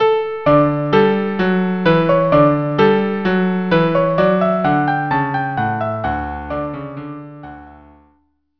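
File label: marche violin cello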